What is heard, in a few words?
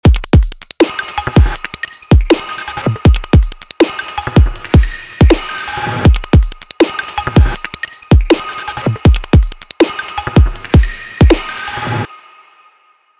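reverb work drum snare off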